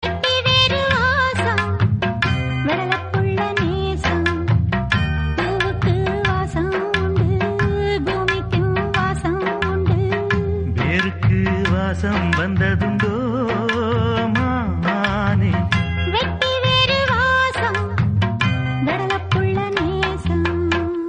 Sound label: Tamil Ringtone; Muthal Mariyathai